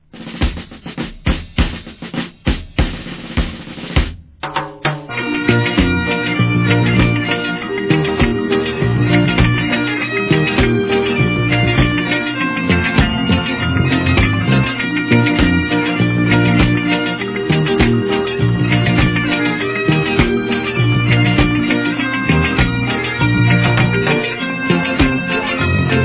Reggae Bagpipes

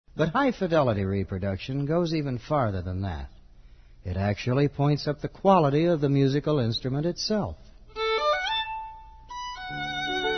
Violin and Garbage Disposal

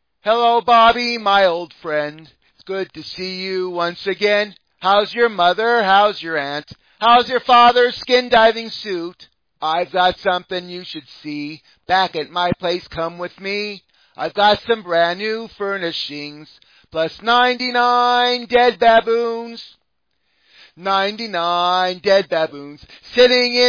Acapella

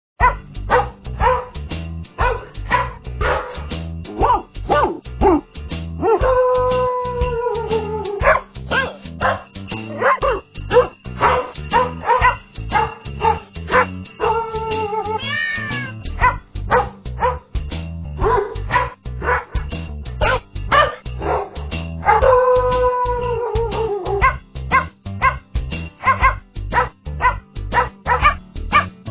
Jingle Bells

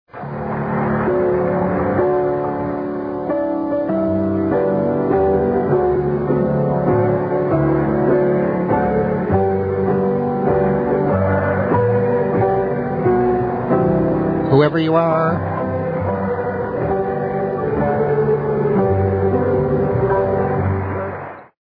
on piano Happy Birthday; Richard M Nixon

